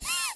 Cough; worms

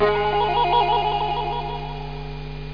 glitter

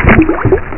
BLU chu Uuu BB; Burning Rubber 1.2.3

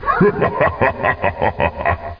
laugh; DrMozek

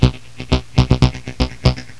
torsion loop; Prima Materia 0.11; Prima Music

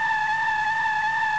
SLIP; SRALLY

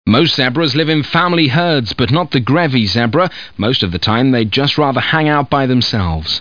jig

